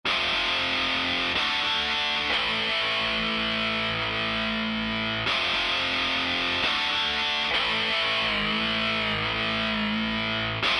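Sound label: Electric Guitar; Sonic Reality Modern Songwriter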